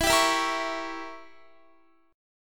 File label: E Diminished 7th E, Guitar; Edim7; strummed